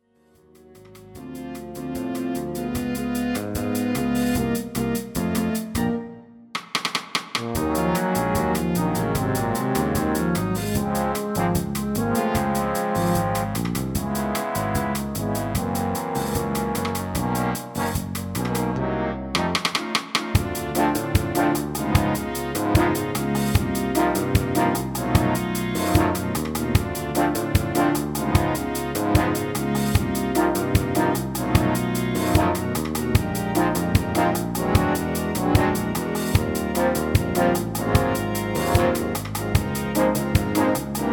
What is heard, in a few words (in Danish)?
Nytaars Finale Trombone; Nytaar 2015